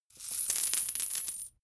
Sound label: coins drop hard drip